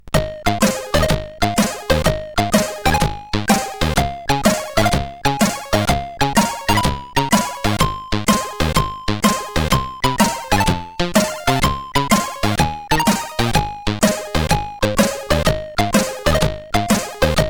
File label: tune, CSG8580R5